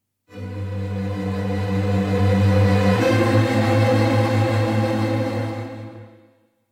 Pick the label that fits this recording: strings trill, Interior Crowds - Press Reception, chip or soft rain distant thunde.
strings trill